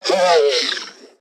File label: Repair Drone Death; Necramech; Battle Barks